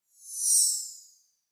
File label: Space Car Fly By, spaceship fx